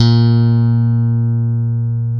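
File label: Chapman Stick, bass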